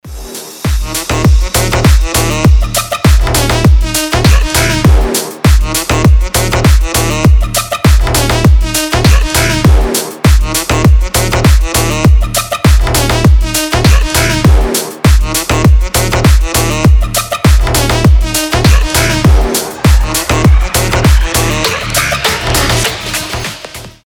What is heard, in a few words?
trap